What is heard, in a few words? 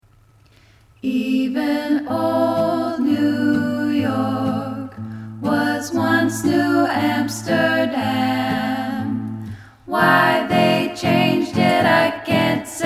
harmonies